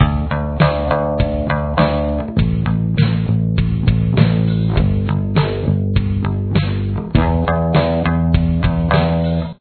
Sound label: bass